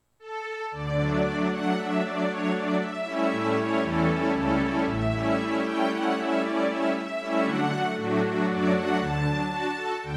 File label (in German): hornkonzert orchester; ホルン; 伴奏; mozart